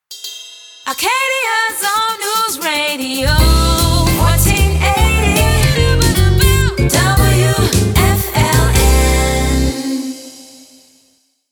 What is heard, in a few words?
Jingle, Slogan; WFLN 1480